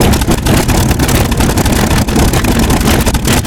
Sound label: Aircrafts Engines Jumo213A Ex R; DCS